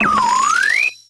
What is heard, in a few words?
Charge Up